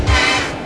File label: blast